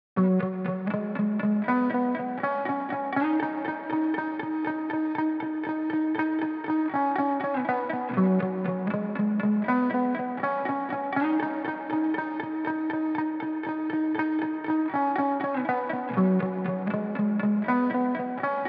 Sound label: 120 BPM B Maj Muted Guitar; Guitar Pack; Loop Stems; Cymatics; Miami Guitar Pack - Pt 2; Tremble